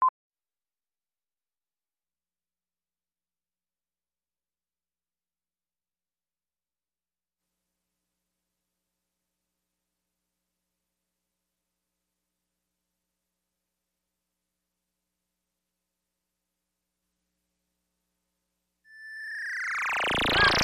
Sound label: Pop Goes the Weasel